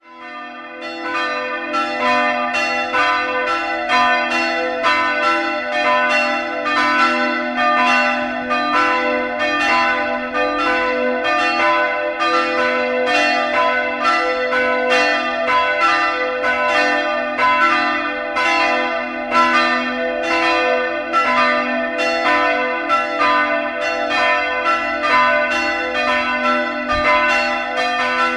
Nittenau ev, Glocken

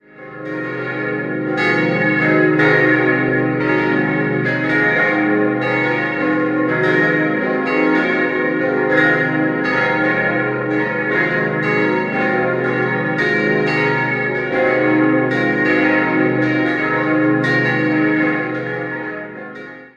Friedberg Herrgottsruh, Glocken

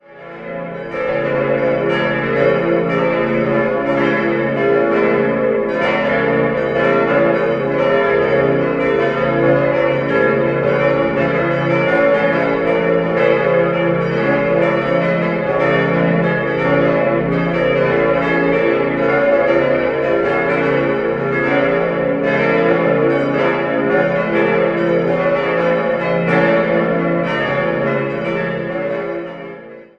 glocken, Pfarrkirche; Landau an der Isar; St. Johannes Evangelist

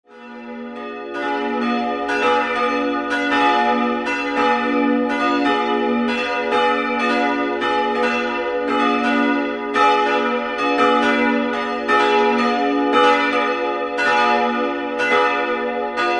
Duern, Glocken

